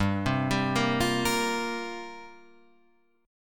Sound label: C 7th Suspended 4th G